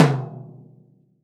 POP H. TOM; VELO TOM 1

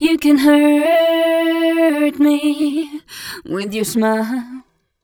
f mel d, fem Vocals

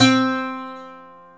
SAZ C 2; Soundscan vol.53; Mediteranean Traditions; AKAI

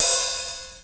HH OPEN; Keith Emerson Signature Series

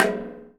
Thunder Drum

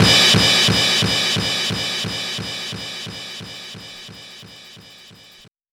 Ride set, Drum and Bass; Ride Set 1; Instrument Samples

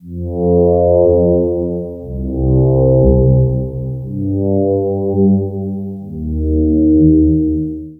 Evening String; Strings & Pads; Sonic Foundry (Sony Creative Software); The Electronic Music Manuscript Collection

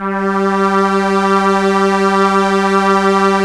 Strings Arp SE G4, ARP Slow Ens

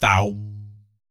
BS, Vocal BASS FX; ILIO; Vocal Planet VOL-3; Jazz & FX

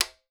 tumba, congas

